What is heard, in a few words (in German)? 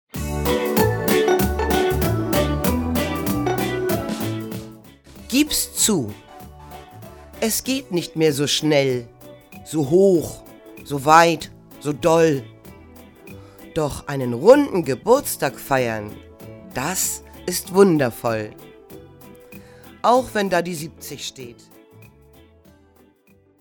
Geburtstagslied; 70; Gratulation